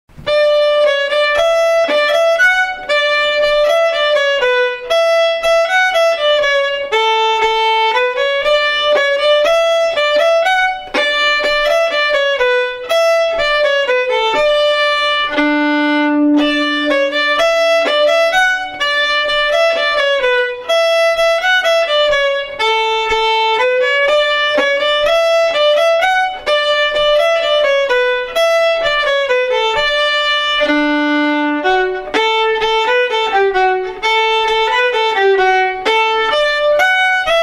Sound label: Come Dance and Sing slow